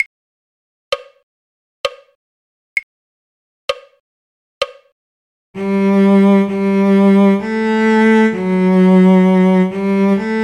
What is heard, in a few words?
cello; god save the king queen; folk